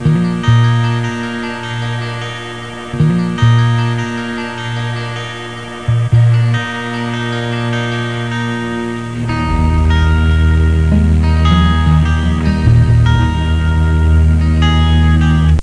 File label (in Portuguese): violão; BigMax nº 9